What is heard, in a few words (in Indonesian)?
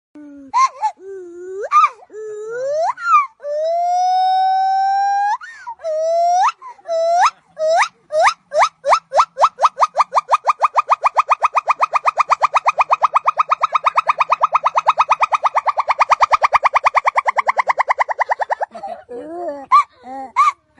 nada dering suara hewan